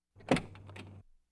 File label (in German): autotür öffnen; Geraeusche